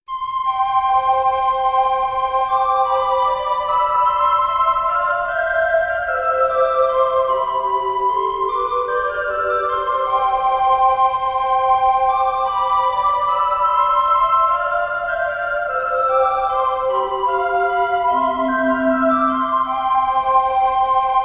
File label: for recorder trio sat; the chimes christmas eve